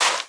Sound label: fs sand, sound characters; Spearhead Demo; MacFormat 2003 June